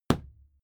fight impact sweetener cloth muffled; krieg-waffen; nahkampf; soundpool

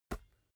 basketball catch gentle

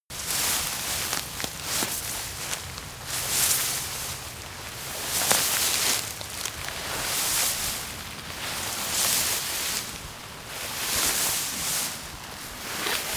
step grass shuffle; schritte; menschen

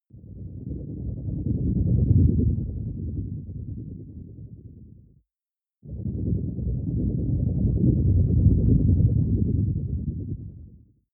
whoosh storm wobble; swooshes-swishes

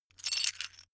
metal pieces clatter short

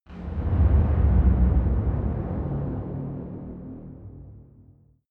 heavy dark door

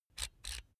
electric drill template adjust soft; soundpool

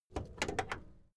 car camaro open hood

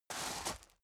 step boots gravel medium scuff; schritte

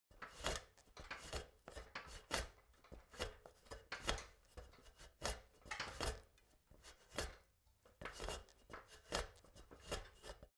step ladder metal scrape; schritte

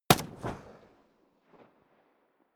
rifle ak-47 exterior fire single distant; comedy-cartoon; Pistols and guns; pistolen-gewehre

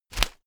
whoosh shredded paper; swooshes-swishes

